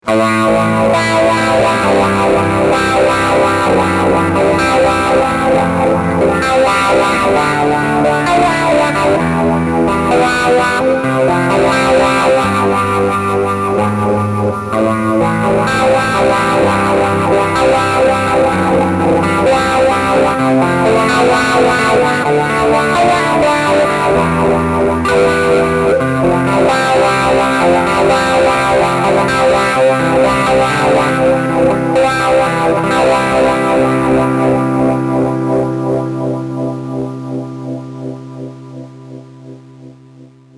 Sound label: Joyo GEM BOX III LoFi Filter